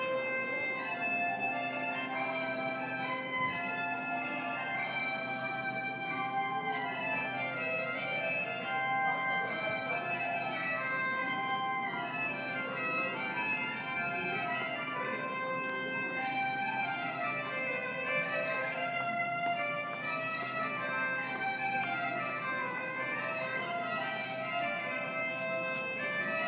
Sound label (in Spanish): tardes de gaita y sol